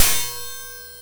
cymb, cymbals

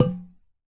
oxaca air, percussion; ILIO

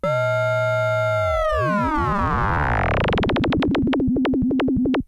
Moog G1, rare synthesizer; Hollywood Edge; Giorgio Moroder Rare Synthesizer Collection

